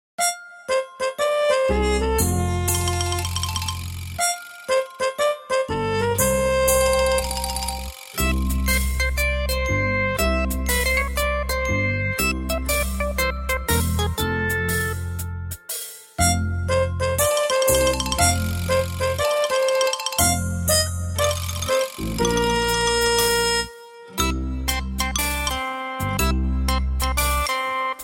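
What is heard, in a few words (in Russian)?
smeshnaia muzyka dlia fona bez slov, funny background music without words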